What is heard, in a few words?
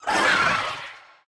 enemy death; bloom minigame